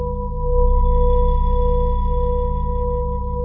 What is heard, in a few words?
drone spacey